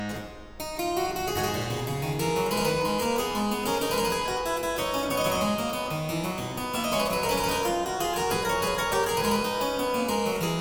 Courant simble in A Minor, Harpsichord

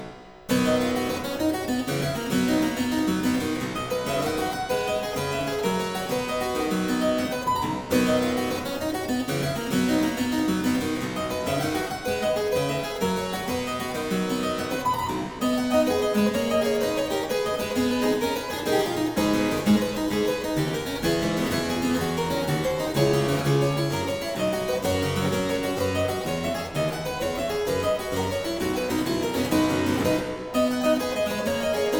Aria Variations in C Major, Harpsichord

